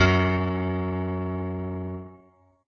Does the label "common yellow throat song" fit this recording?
No